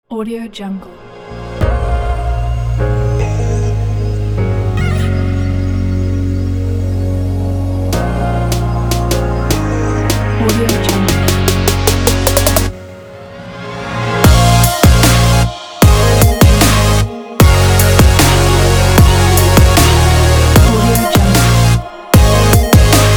future bass

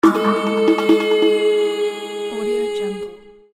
vikings failure

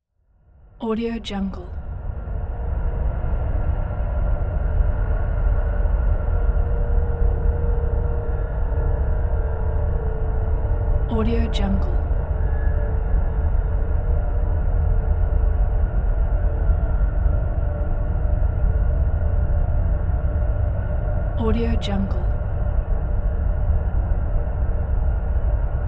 cinematic horror ambience

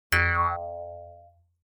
cartoon percussive mouth harp

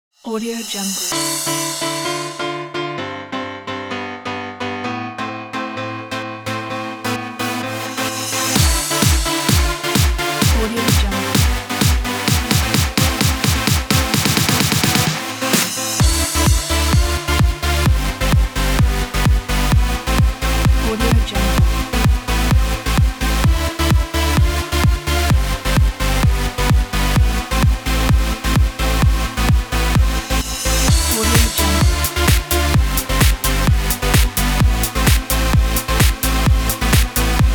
uplifting dance

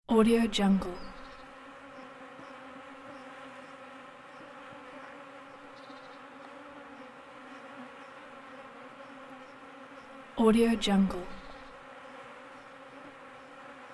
summer forest insects; backupcrew

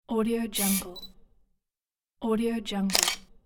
camera shutter

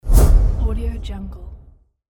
woosh

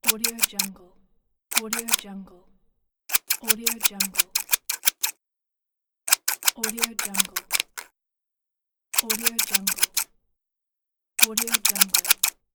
scissors

